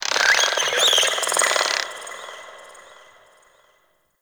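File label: Settlement Distant Insect; Ambience; Warframe; Grineer Settlement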